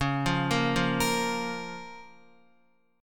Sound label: Db 6th Db, down, Guitar; arpeggiated; 4E,4A,5D,5G,5C,6F; JGuitar